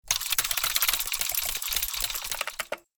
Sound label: Small gears mechanism movement